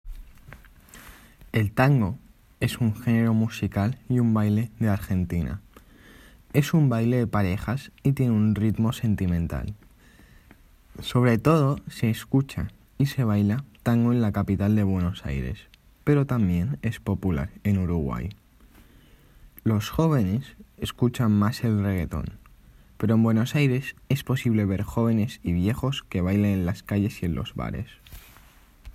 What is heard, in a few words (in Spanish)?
El tango